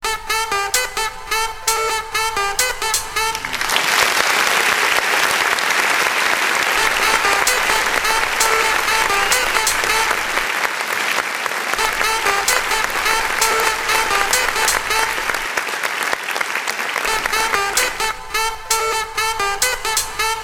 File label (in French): jingle